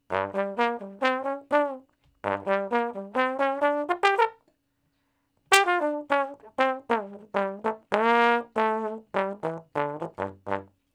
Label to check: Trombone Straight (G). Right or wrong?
Right